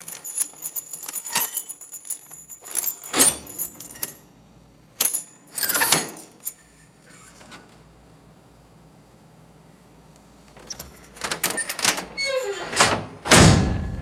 heavy iron prison gate opening and shutting; doors

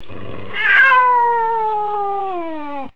small cat growling and yowling; animals

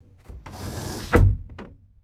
sliding window shutting with a thud; glass

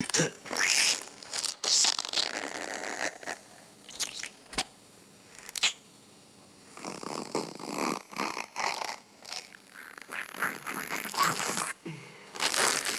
wet squishy gory crunching bites

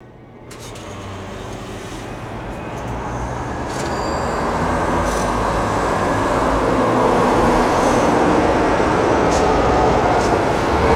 electric bus passing by with cable twangs; vehicles

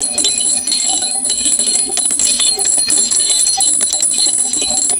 rapid electronic slot machine jackpot bells; game

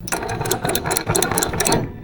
rapid mechanical magneto winding crank

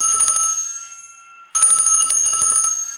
mechanical bus bell rings twice; bells

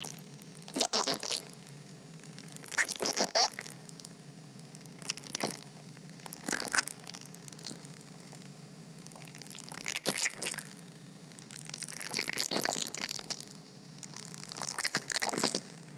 wet thick gel squishing and plopping

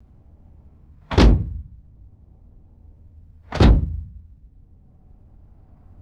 two metallic car doors closing; vehicles

